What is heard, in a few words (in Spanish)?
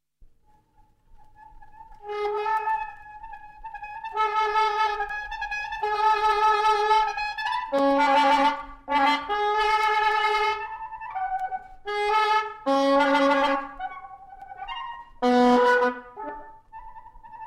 Saxofón